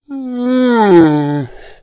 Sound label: Pig Yawn; Angry Birds Space